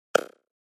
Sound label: Button Reverbed Cup H